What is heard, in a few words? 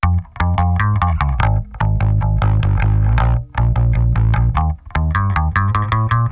Chill Pop Bass F min, E-Bass, Pop Rock; 076; Pro Tools Essential Loops; Digidesign